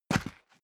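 step boots single concrete grit single stair down, footsteps; schritte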